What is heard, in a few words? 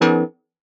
stab leet, stabs